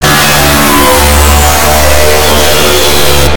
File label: jumpscare; alarm